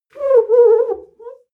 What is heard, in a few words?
clean window paper towel slow howl